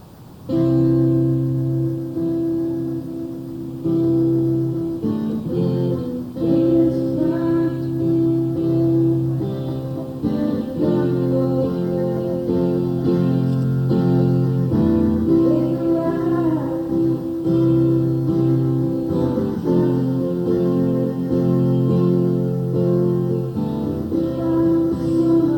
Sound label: soundcheck